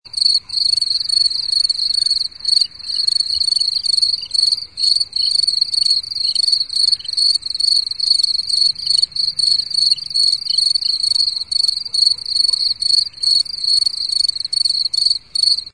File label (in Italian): insetti insect; animali